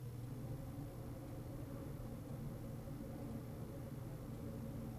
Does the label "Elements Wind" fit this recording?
Yes